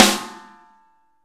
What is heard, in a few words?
SN ambient; Best Service; Real Mega Drums VOL-1